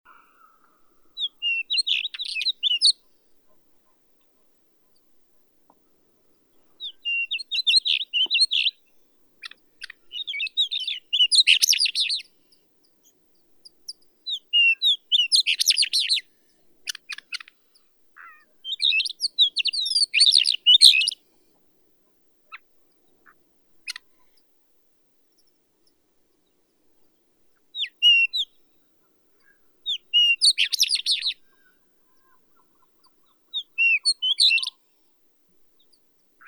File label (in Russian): chekan bolshoi saxicola insignis